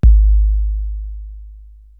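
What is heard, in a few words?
Bass Drum, Drum Machines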